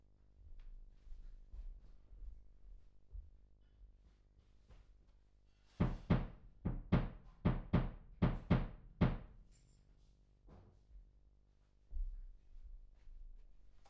Voc Fig8

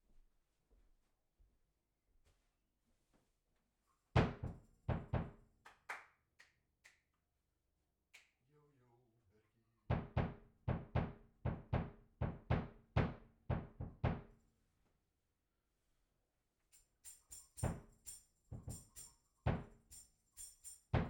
Bass Mic

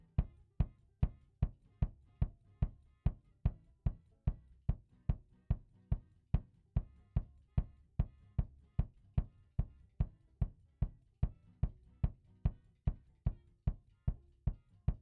Kick

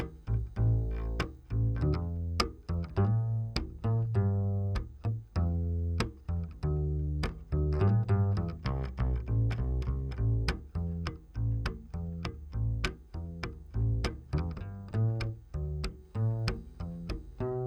Bass DI

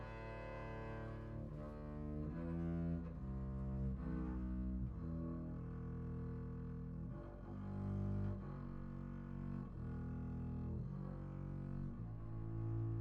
Voc Omni

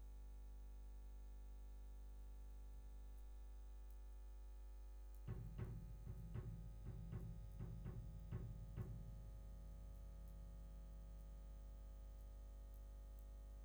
Bass DI

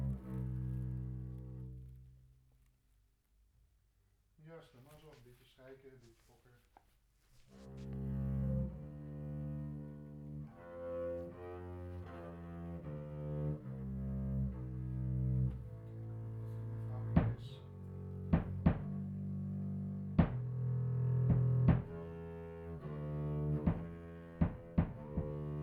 Banjo

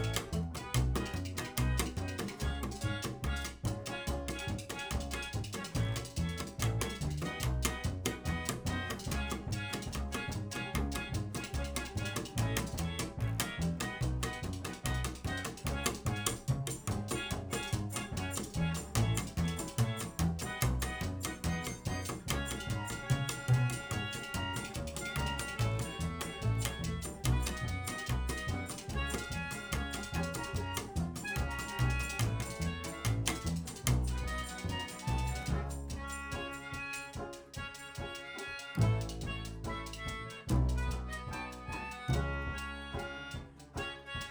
Bass Mic

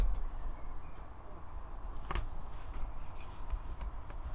Voc Fig8

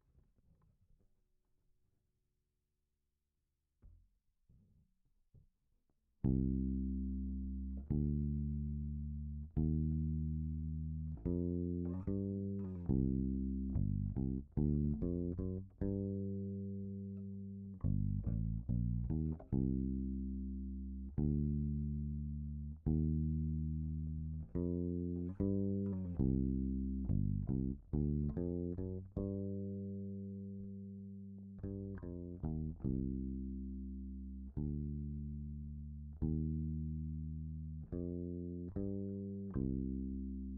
Bass FX; nuit

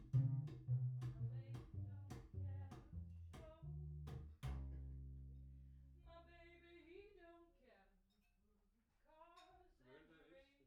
Chordz

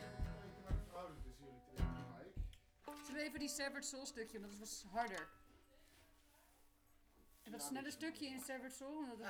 Banjo

